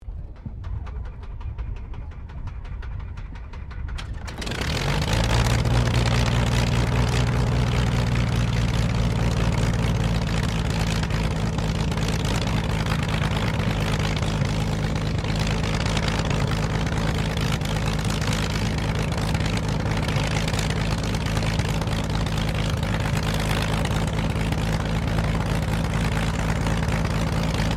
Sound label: AIRCRAFT CT4A STARTUP IDLE, military trainer aircraft; Airplanes; Vehicles